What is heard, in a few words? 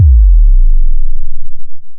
kick